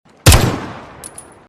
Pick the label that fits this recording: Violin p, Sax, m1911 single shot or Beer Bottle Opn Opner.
m1911 single shot